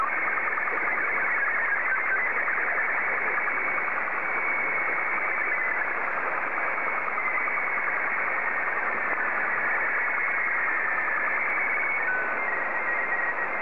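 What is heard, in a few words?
Robot 12 black and white, slow-scan television; JA3QZS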